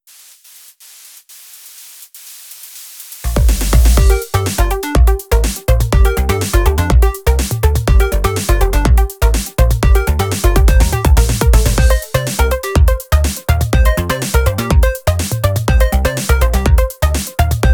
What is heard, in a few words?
Party Jingle long